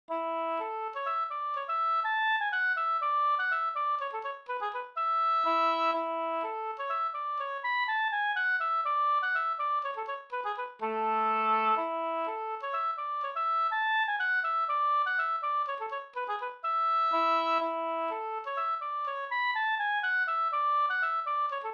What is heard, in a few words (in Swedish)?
polska; e Lejsme Per Malung